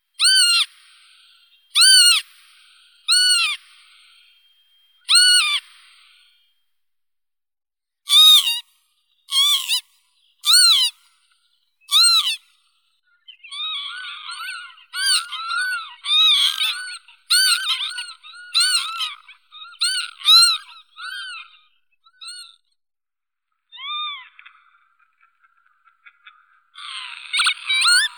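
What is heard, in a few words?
northern lapwing, vanellus vanellus; chibis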